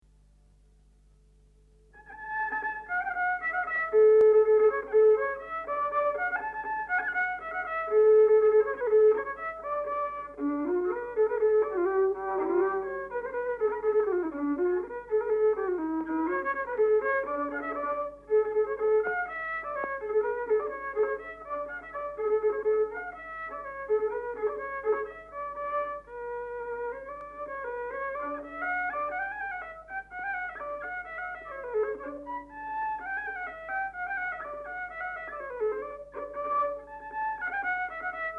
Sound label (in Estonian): viiul, folk; Urvaste; Uts taht imma; Otto Hiiop